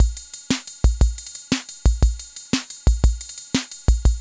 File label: BALLAD, KR55; INIS - Funky Xtreams